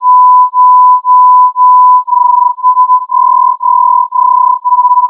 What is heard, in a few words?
Throb2, modes